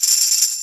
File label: TAMB SHAKE, ORCH PERC; Greytsounds VOL-1